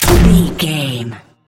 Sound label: gun grenade launcher shot